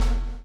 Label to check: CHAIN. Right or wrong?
Right